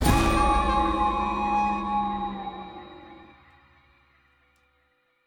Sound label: End Stinger; Baby Wendigo Attacked